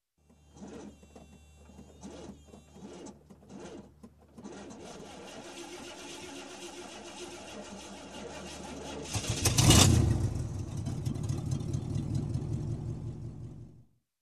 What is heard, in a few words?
Old Engine Startup